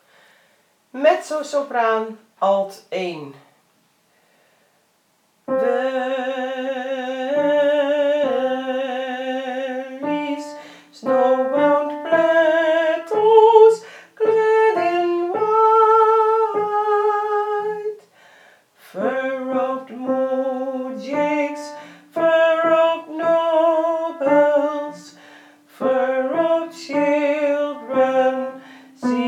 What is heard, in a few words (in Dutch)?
mezzo alt